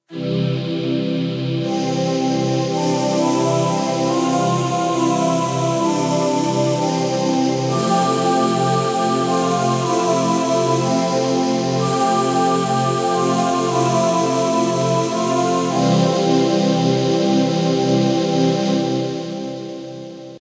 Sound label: Voice, Kawai K1